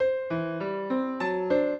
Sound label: trio, piano; Mozart